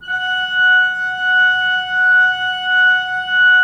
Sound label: Pipe Mix Celeste, 3D Organs